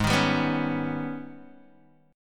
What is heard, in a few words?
G sharp Minor Major 11th, Guitar DADGAD; 6,6,5,4,4,x